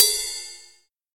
crash sound d key, Royality free crash cymbal tuned to the D note. Loudest frequency: 7624Hz; drum sample; single hit; one shot